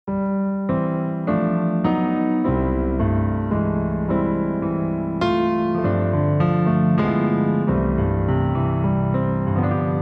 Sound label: Waltz